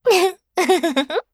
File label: laugh; Eternal Return; Garnet; KOR